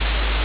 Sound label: ambience room tone